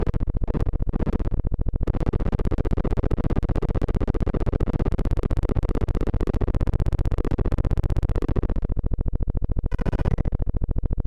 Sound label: kid keys glitches dist